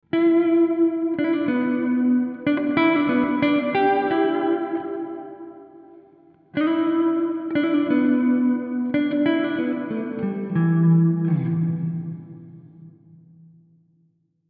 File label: Guitar Golden Plate